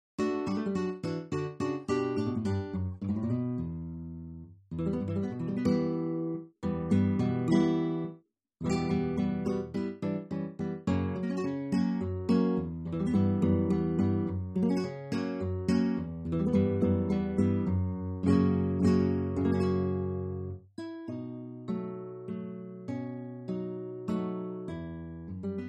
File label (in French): fantaisie espagnole; Castillo David del